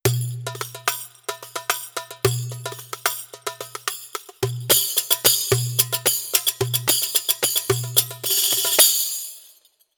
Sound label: Arabic Perc Kit